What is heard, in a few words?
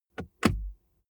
car bmw e46 interior center console close